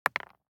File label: DICE on Neoprene, Throw and Roll, Mini, 1 One Die; Samples; ArticulatedSounds; DICE Sfx Party (Extended)